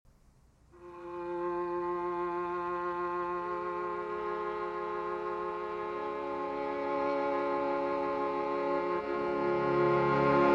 String Quartet in F Major, Lento Assai, Cantante e Tranquillo; String Quartet No. 16; Op. 135; Più Lento